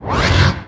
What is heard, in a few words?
Pickup Magic; SFX Kit; Pickups